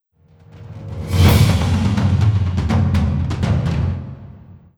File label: Beast Play Stinger